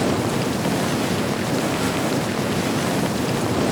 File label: Ski Loop; Misc Sports